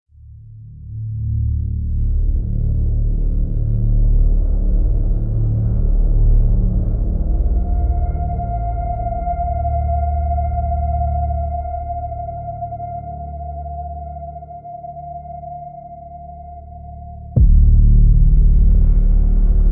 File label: Subtle Noise, psychological dark ambient quiet restless